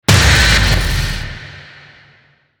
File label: sun hit big